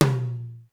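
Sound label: high flat room tom, sr16 tom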